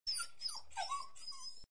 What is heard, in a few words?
dog whing; Maths Prep Package